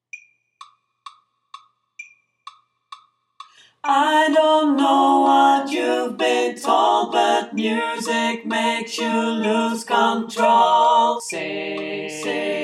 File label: Sing chorus alt